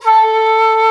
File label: SHAKU, WOODWINDS; Roland - LA Composer vol. 1